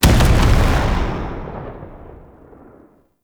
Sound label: Gatling Gun End Stereo; Warframe; Tau Gatling Gun